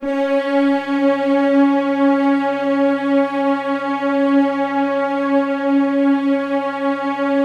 C#3, Keypad; Standard Instr

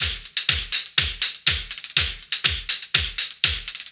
FX kit, beats; Sonic Foundry (Sony Creative Software); World Pop

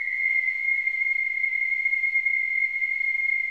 WHIS, whistling

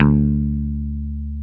BASS, C#3

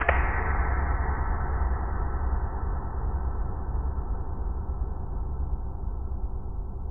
Gong Brush Hit, Ambient Metals; Sonic Foundry (Sony Creative Software) - Ambient Atmospheres & Rhythms

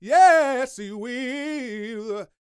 GOSPL TIM 190, Vocal Gospel

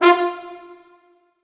Orchestral Brass

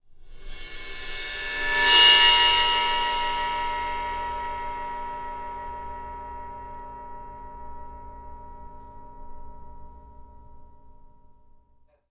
Void Perc; The Void; Processed Drumkits

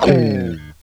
SLIDE DN, FUNK CHORDS, Guitars; Spectrasonics; Hans Zimmer Guitars VOL-1

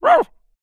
DOG BARK, VOCAL FX; Spectrasonics Vocal Planet; Jazz FX

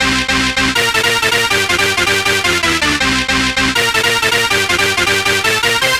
Dance Synths, Hardcore Loops, Synth Loops

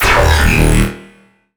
D bass

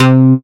synth c, Euro Style; Best Service ProSamples vol.37 - Dance Synths

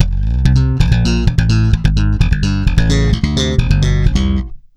FILL, C#, 130 BPM, Bass; Sampleheads; Ultra Freakin' Bass

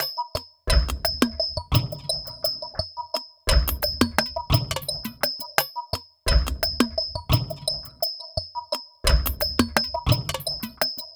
Percussion, cinematic funk

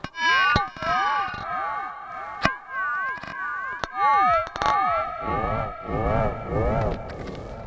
SPACEY R, Funk Guitar; Zero G - Funk Guitar

